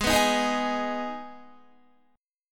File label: Ab Minor Ab down Guitar; strummed